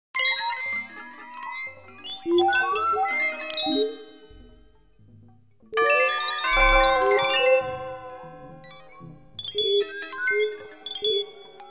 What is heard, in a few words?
truly noisy thoroughfare